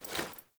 Foley Action Default Melee Swipe, Foley Default Clothing; Characters; Player